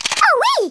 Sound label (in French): armes; worms